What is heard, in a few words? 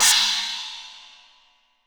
RESO CYN, DRUM KIT; AKAI S-Series CD-ROM Sound Library VOL-4